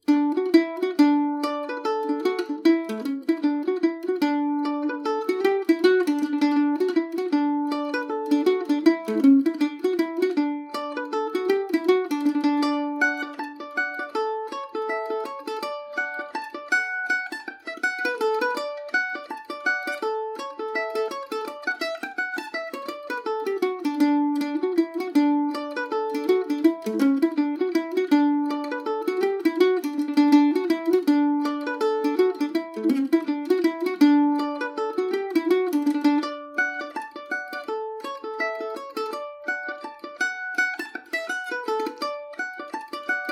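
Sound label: humours of newcastle D major, irish mandolin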